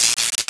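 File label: ambience electric arc; Star Wars Knights Of The Old Republic II; XBOX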